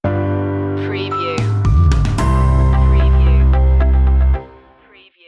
happy go lucky stinger

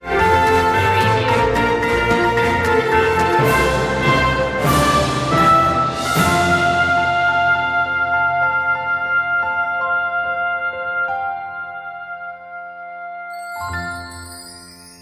orchestral; jingle bells; media music now

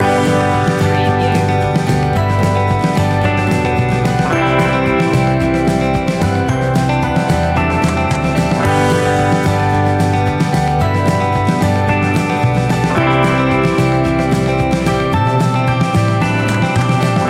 that folky feeling loop

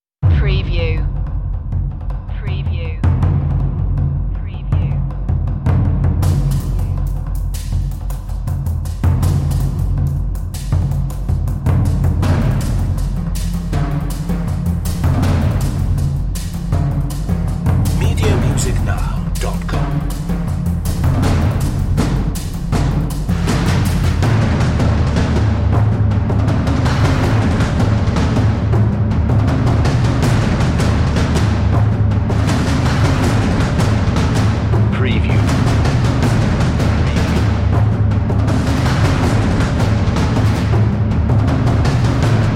perc fx

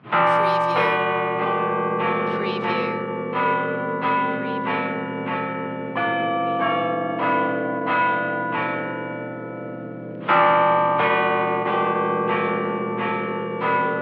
Church Bells Joyous Fast

